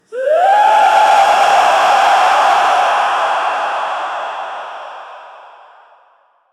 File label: chant